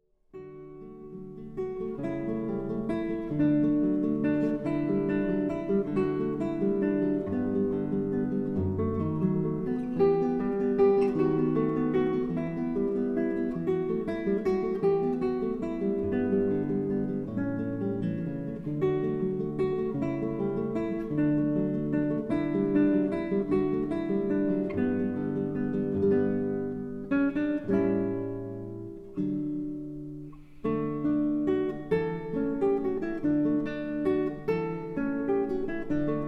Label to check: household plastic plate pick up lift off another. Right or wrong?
Wrong